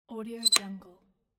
squeaky basic door latch open; domestic sounds

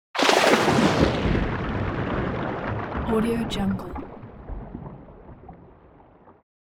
cinematic slow motion source water; transitions and movement

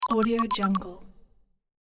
mobile phone ringtone; interface sounds

